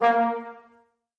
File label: trombone toot